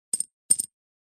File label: leisure game plastic small discs set down; zapsplat